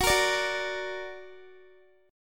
F sharp Augmented F sharp Guitar; x,21,20,19,18,x; strummed

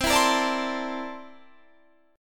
C 13th C down Guitar Standard; C13 strummed

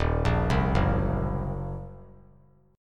Eb Minor 6th chord; arpeggiated

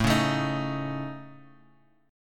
A Major Flat 5th Guitar Open F; strummed

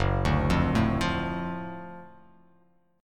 F sharp Minor Major 7th Sharp 5th F sharp down

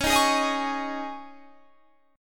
C sharp Suspended 2nd, C sharp, Guitar, Standard; C#sus2; strummed; JGuitar

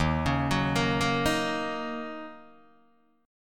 D sharp Minor 7th, Guitar, DADGAD

